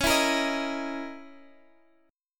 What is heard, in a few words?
C sharp Minor 6th Add 9th, Guitar Half Step Down; chord